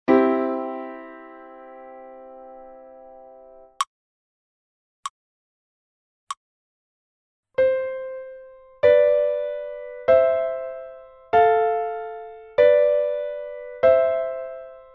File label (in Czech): Dvojhlasa melodie CEG FAST